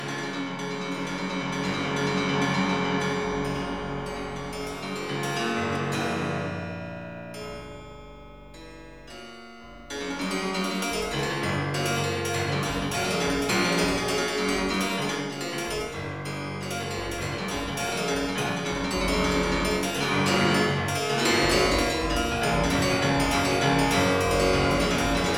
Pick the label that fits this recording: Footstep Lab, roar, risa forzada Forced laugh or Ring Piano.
Ring Piano